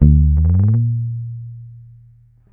Bass one shot Slider, Electric Bass; Slate Digital BASSHOLE Sample Pack

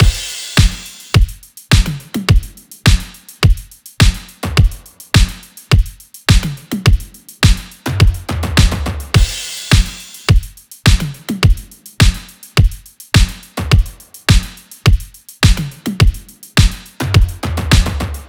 drums 105, Drum Loops, Full Drums; Camaro; Slate; MIDNIGHT Sample Pack

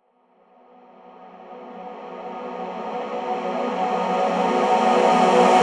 reverse, vocal sample

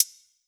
Closed Hat Soar, 808; Slate; Vintage Drum Machines Volume 2 808 Edition

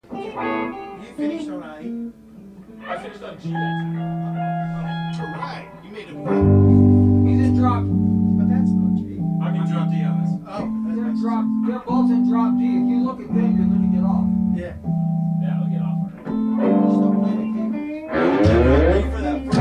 Background Mix

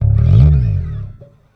Bass one shot Slide FX Low, Electric Bass; Slate Digital BASSHOLE Sample Pack

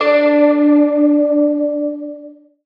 tonal oneshot D; Pop; Slate; PASADENA Pop Sample Pack; Tonal One Shots

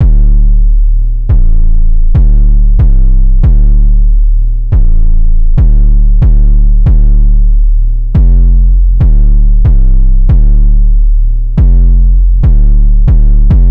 808 loop 140 Dm; Slate Digital BASSHOLE Sample Pack

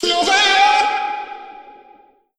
Vocal one shot Fm; Slate Digital Omnivox Vocal Sample Pack

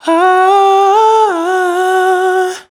riff smooth run Em, Dry Vocals; Raw Vocals; Slate Digital Omnivox Vocal Sample Pack

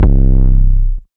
808 Bass Clobbered C3; Slate 808 Deep Dive; SD Signature 808s

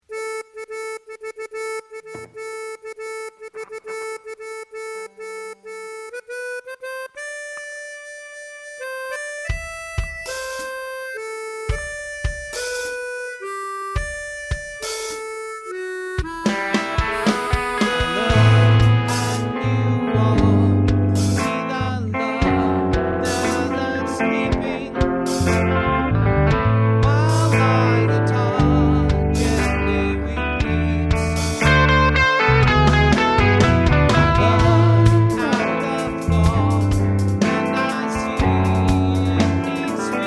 Strings

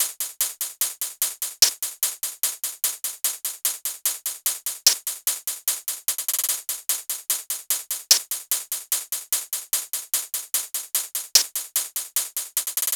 hats 148, Hat Loops; drum sample pack; Butajira; SEISMIC; Slate

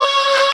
vocal beam C#; Slate; AURORA Sample Pack; Vocals

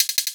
hat sputter, Closed Hat, Drum One Shots; SD MIDNIGHT Sample Pack; Slate

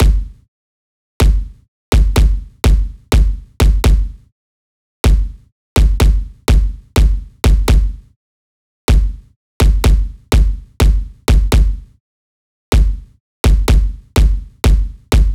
kick loop 125; Pop; Drums; Loops; Slate; SD PASADENA Pop Sample Pack

